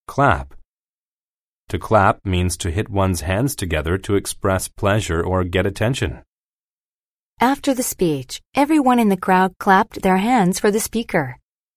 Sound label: clap